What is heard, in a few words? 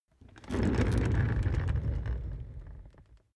office chair loaded trundle